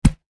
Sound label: hit sound